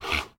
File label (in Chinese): 驴叫声